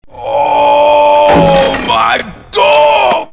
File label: "ooh"; pvkii